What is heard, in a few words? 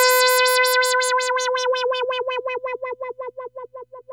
2600 B4, ARP 2600; Hollywood Edge - Giorgio Moroder Rare Synthesizer Collection